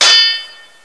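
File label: metal; thief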